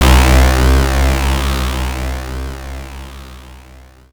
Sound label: Anasound Long lo C, Industrial Analog Single Notes; Sonic Foundry (Sony Creative Software)